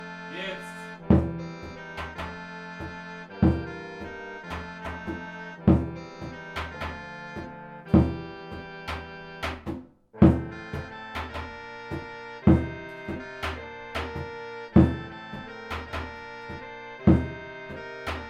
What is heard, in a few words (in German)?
tribute langsam surdo melodie